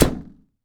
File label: Arrow Hit, Longbow; SteamVR; InteractionSystem